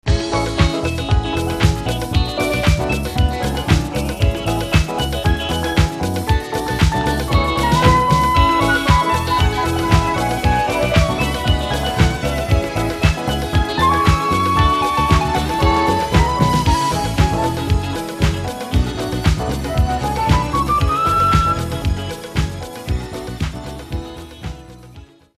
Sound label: jingle